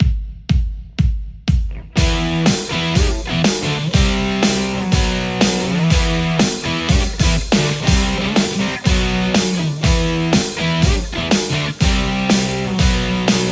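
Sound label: Telecaster; ronald; rechrome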